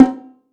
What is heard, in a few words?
bongo low